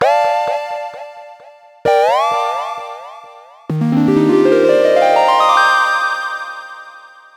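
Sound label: G Poly Accents, 130 bpm; Zero-G; Future Wave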